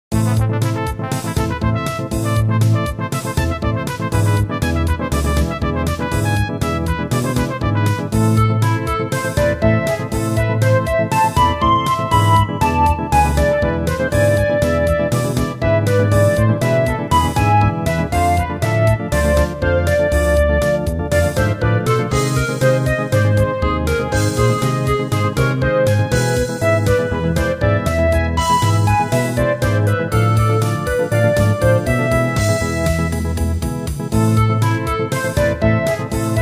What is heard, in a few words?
bangra